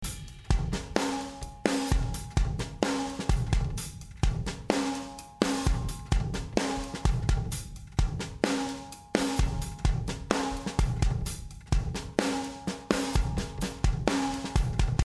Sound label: drumloop